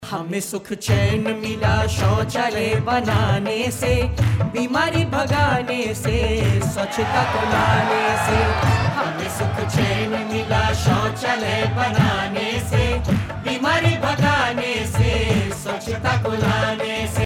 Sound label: qawwali ringtone